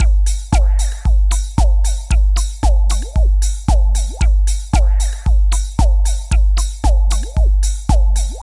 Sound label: LOOP, Tekno Industrial; East West